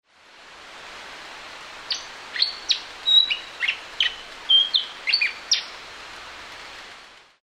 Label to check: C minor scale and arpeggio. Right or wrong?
Wrong